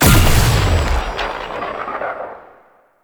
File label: Heavy Orbital Strike Cast; Abilities; Warframe; Tau; Dax Heavy